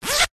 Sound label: clothing jacket cloth zipper; zapsplat